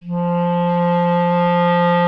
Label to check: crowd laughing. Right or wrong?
Wrong